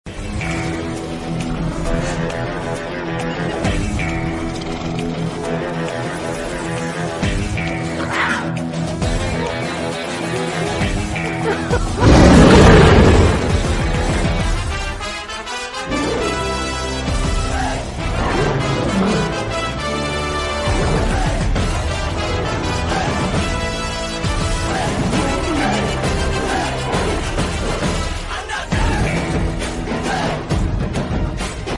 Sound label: Bgm Jungle King ringtone